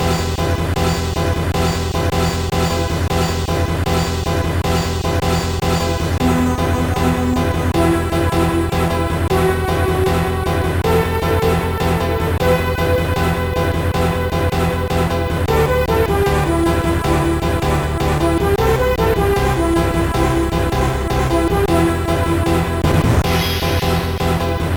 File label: biing bong high; Soundcontrol 3.0-5.0